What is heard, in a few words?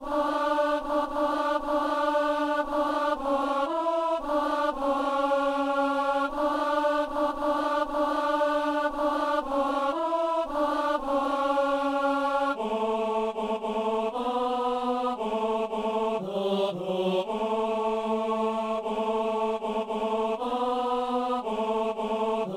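tenor; Gloria Patri Palestrina; en la majeur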